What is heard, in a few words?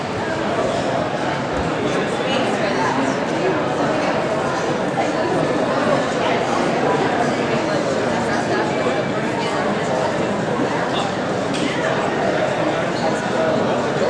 marketplace, ambience sounds